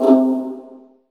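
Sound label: viola pizzicato C2 right, Virtuoso Strings; ILIO; Kirk Hunter Virtuoso Strings VOL-2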